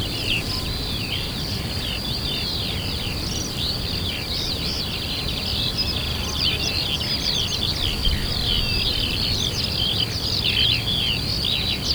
mezei pacsirták enyhe légmozgás gémeskutaknál; termeszetben; hortobagyipuszta

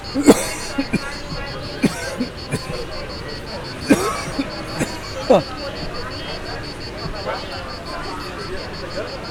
tengerparton, kohoges; makryammos beach; thassos

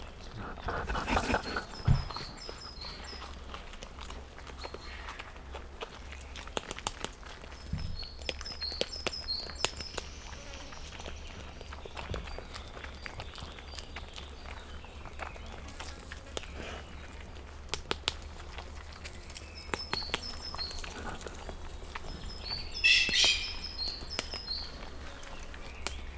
egy kis összeröffenés az elején, tarajos sül; felsolajos zoo 2015; cardioid

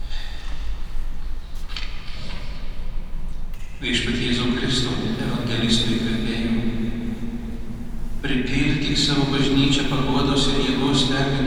jézus krisztus templom natúr mise

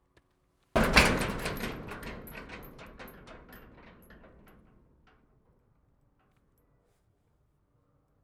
kapuba valo belerugas

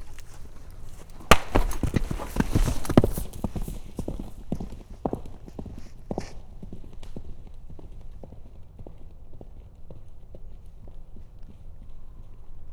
hatso jara csapnak, lo; állathangok; paraszt udvar

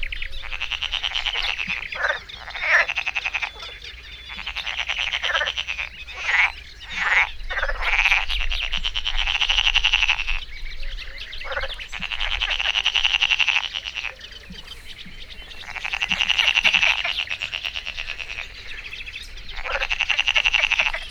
természet, békák; belso vegallomas; hortobagyi halastavak

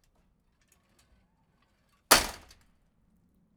kertikapu

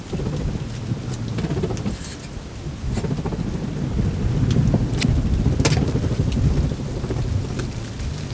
másféle kétszer, denevérek hallható hangtartományban kommunikálnak; termeszetben

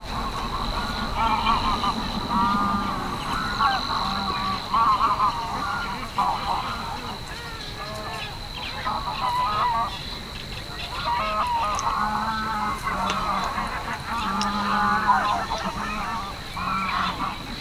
hajnali 5 óra halastavak; hortobágyi nemzeti park 2013

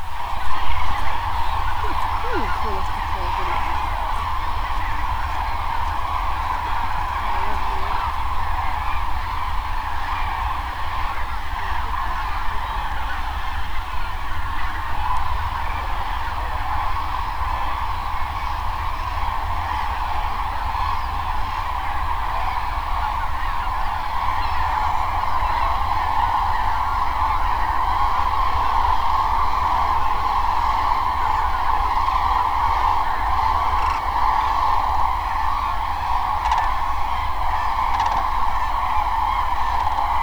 távoli és közeli, daru; termeszetben

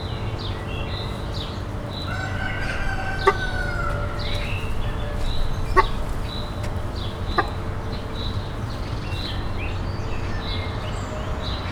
háromszor egymás után, vörösásólúd; kecskemeti zoo 2016

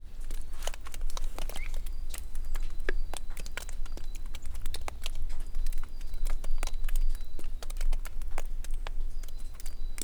turista beülő, hó olvadó; Visegrádi-hegység

